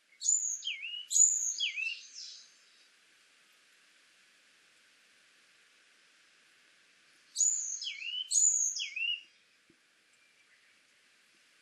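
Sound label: varied tit, miyake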